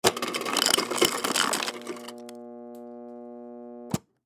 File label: Refrigerator Ice, Ice Machine Dispense Cubed Ice Cubes Into Glass Cup; Household Refrigerator Ice Machine Dispense Cubed Ice Cubes Into Glass Cup Appliance; Blastwave